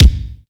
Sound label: KICK, GATE KICK